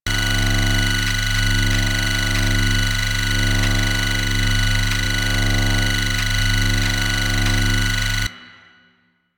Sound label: Computer Terminal Loop; Ambience; Props; Warframe; The New War; Zariman; Quests